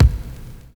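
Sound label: noizy bass drum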